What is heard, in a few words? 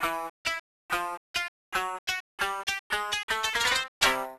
Timer Bonus; Zany Golf; Apple IIGS